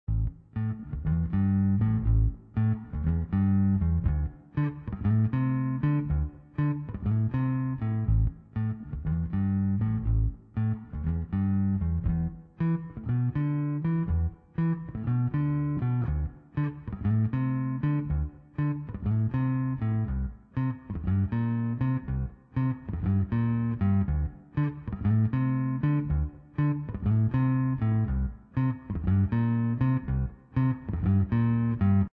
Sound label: Accordion - Blue Electric Bass - Keytar - Green Keytar